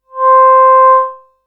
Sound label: Vocalist Simple